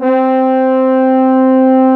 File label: French, SC55 brass